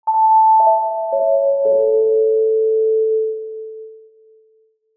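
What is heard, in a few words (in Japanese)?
お知らせ・終わり・遅め・リバーブ有り; 開演ブザー; 演劇・舞台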